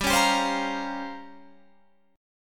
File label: A sharp Minor 6th Add 9th G, Guitar chord